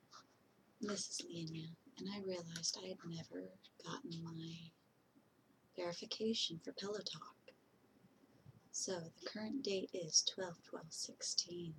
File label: F Pillow Talk Verification and Lullaby